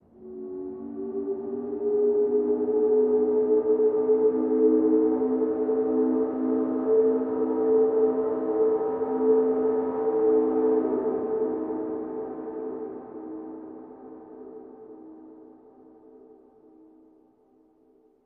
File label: Horn Train Fog 1 Long